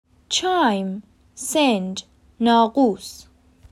Chime

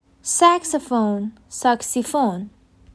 Saxophone

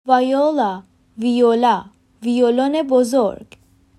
Viola

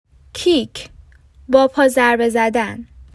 Kick

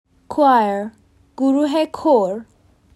Choir

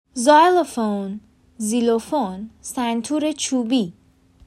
xylophone

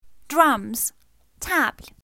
Drums

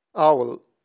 Owl

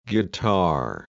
guitar

